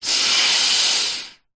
human male middle aged single breath in nasal nostrils